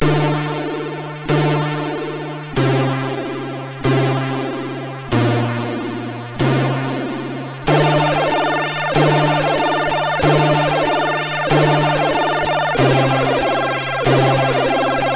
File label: Music Box tune, MOS6581R3